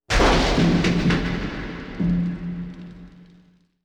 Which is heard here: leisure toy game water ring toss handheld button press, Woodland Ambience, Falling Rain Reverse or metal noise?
metal noise